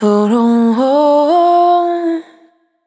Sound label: Anna Banana, Vocal Samples, Single Voice Melodic Loops; Singles